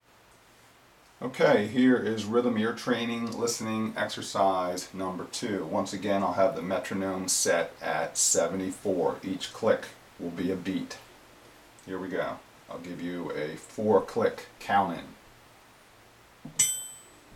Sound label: Rhythm Ear Training Exercise